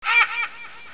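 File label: vulture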